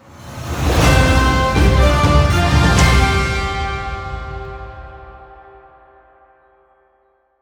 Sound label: epic victory logo, 123bpm; Spellsword Cards Dungeontop